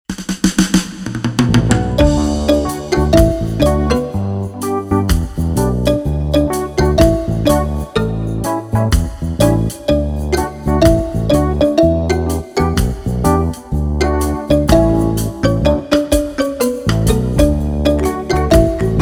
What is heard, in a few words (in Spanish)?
Pista con melodia instrumental